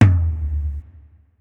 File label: Kit, breakcore Drum Hits